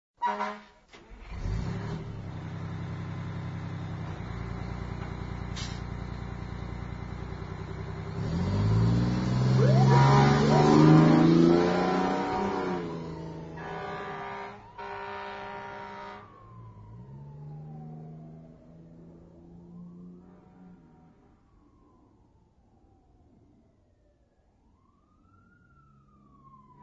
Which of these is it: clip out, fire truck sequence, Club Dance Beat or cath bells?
fire truck sequence